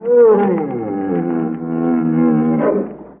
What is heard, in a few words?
vox bantha